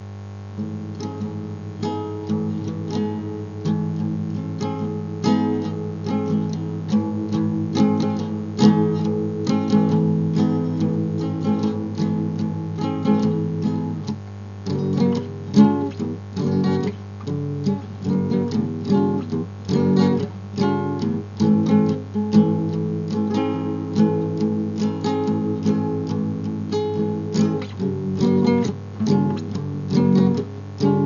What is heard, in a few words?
baby guitar